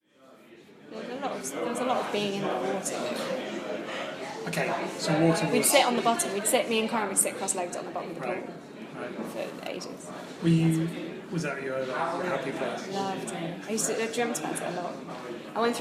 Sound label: swim chat breathing underwater